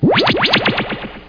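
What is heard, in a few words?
teleport; sw demo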